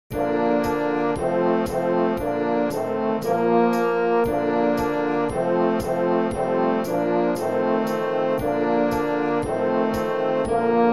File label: concert Duet medley